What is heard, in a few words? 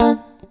STRAT, GUITARS; Synclavier Sampler Library